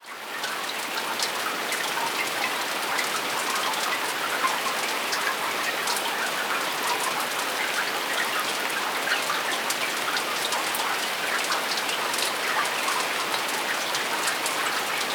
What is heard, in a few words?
Rain on the Dock; Whalley Lake; whispering landscapes